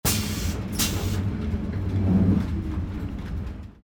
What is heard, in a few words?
hydraulic fx